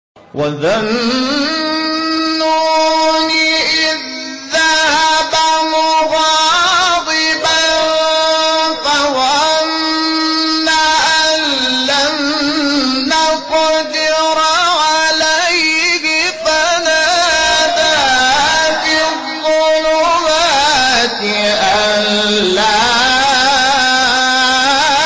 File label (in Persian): تلاوت زیبای آیه سوره; انبیا; محمود شحات انور